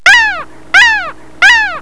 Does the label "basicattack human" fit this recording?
No